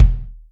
Bd vman, Yamaha DTXpress Acoustic Kick

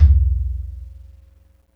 Sub, drum

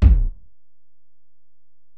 Ac BD, Alesis DM-PRO; 300 Drum Machines

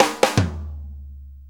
Shuffle Fill; Shuffles; Fills; Drum Tools

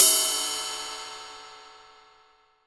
TIP, 18 ZIL RIDE

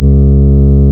REED C#; Big Fish Audio; Synclav FM

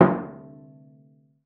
CELLO FINGER; 90 s Sample CDs; Xsample VOL-5

